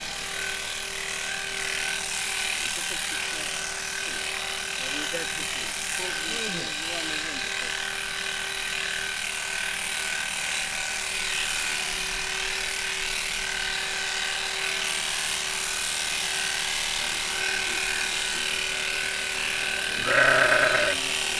juhnyiras, kek juh; miskolc zoo

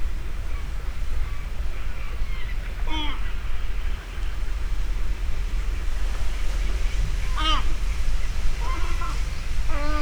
vízen lévő sirályok, sárgalábú sirály; Hortobágyi Nemzeti Park

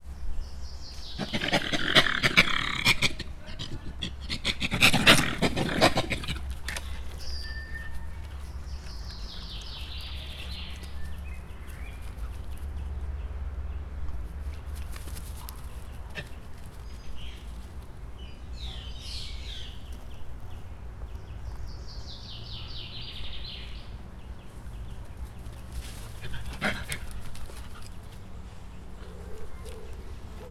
acsarkodnak, rozsomák; szegedi zoo 2011

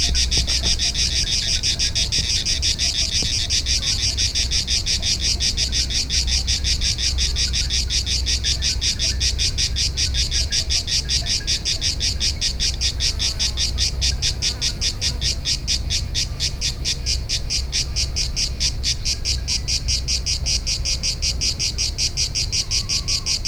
ahogyan befejeződik a nóta, plebejus kabóca; allathangok; termeszetben; kecskesut

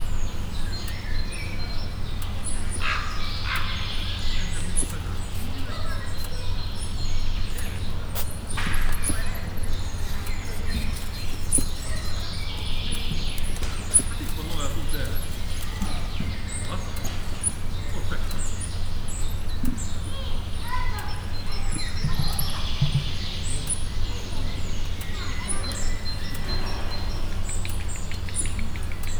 magas hangocskak kozonseges hollo es emu is, ormanyos medve; miskolci zoo 2018

